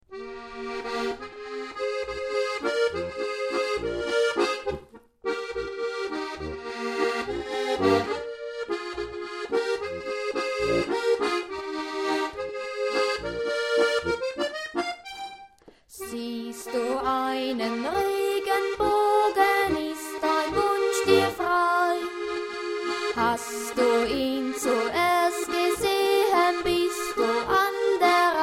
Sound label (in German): Regenbogenwalzer